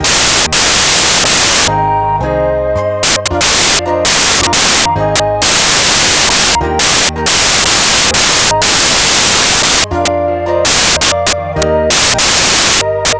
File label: fantasy, bgm; pictcode